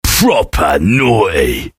hurt vo

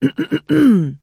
trader clear throat; 7D2D; 商人杰恩; 七日杀

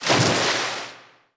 Dolphin splash; RA3

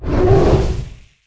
Waterelemental death

